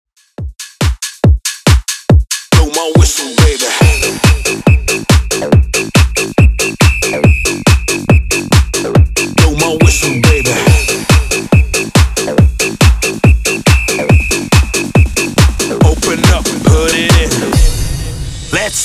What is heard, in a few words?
Re drum; DjAligator